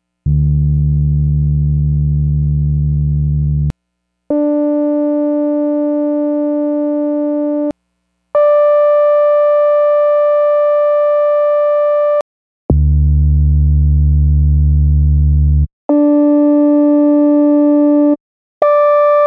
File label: Organ; Compare CS80; Xils